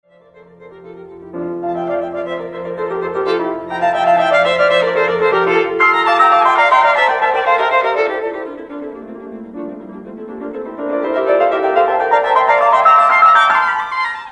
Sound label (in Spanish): Doble concierto para violín piano y orquesta; Frédéric Mendelssohn; Programa 11 Doble concierto para violin, piano y orquesta de Mendelssohn